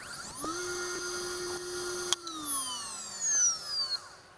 hdd vacuum

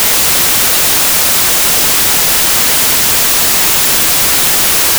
noise; digistuff

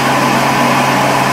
handdryer run

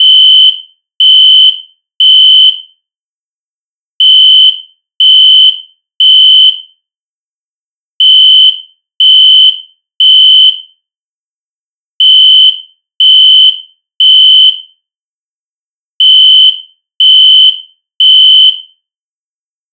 fire alarm synth looped

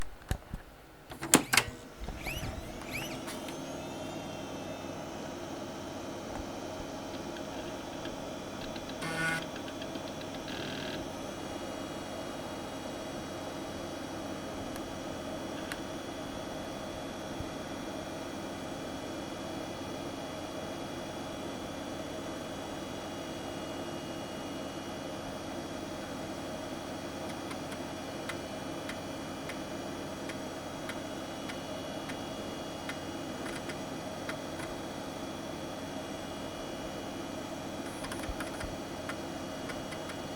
486 boot floppy

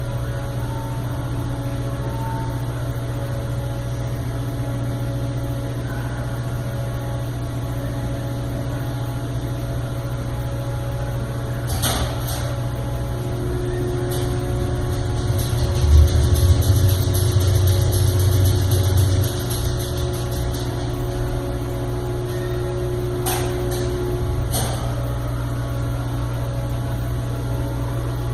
bg noise

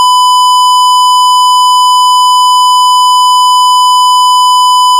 square; digistuff

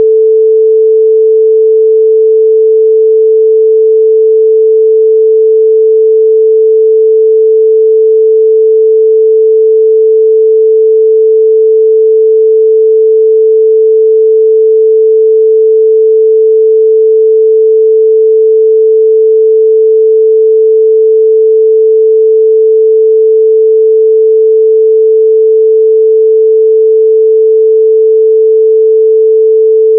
440hz sine

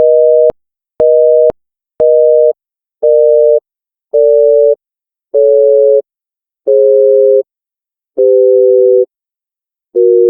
busy

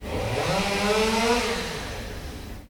chainsaw; dreambuilder modpack; technic

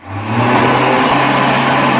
hand dryer start

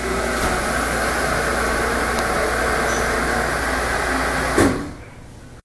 elevator parts motor stop hydraulic

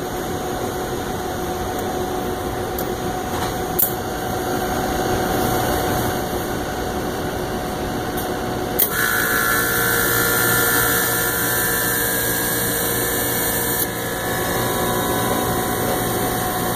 ice machine

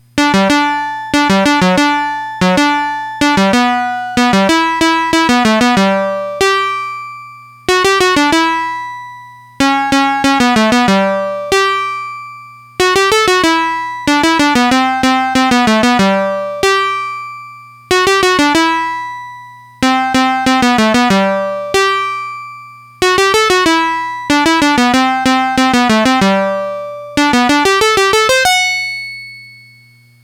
office opl2

